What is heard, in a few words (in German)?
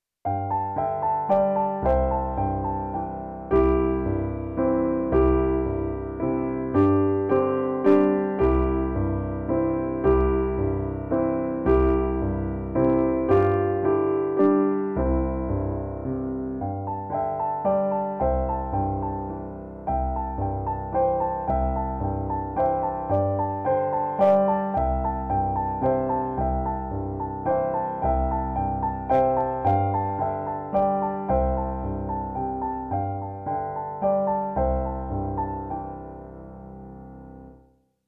Zweistimmiger Walzer in C